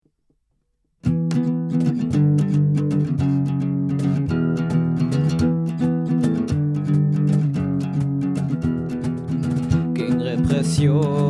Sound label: jingle